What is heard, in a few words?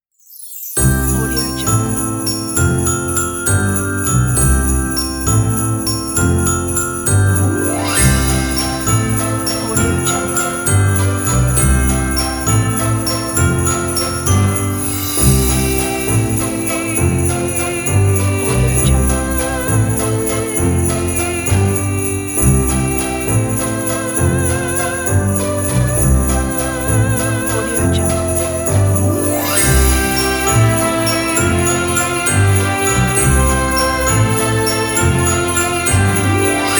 this is christmas kit